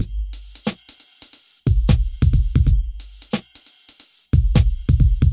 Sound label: 090 hiphop super bright, drum loops